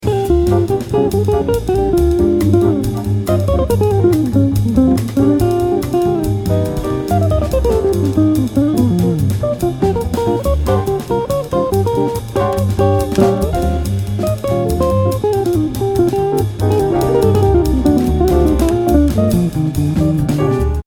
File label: Bb Blues solo